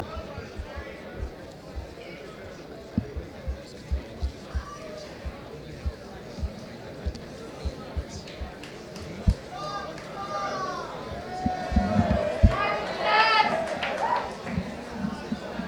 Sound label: Tuning